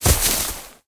thick forest land; Golden Crayon Sounds

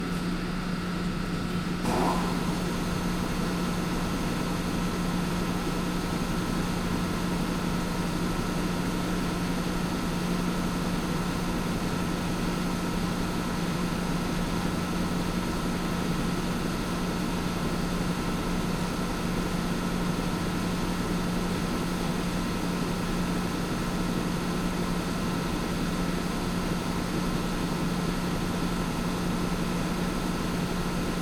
factory; Golden Crayon Sounds

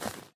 grass step; Golden Crayon Sounds

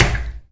bathtub land; Golden Crayon Sounds

